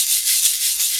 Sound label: Shaker Loop; 300 Drum Machines; E-MU MoPhatt